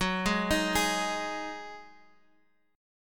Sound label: D sharp Minor Double Flat 5th F sharp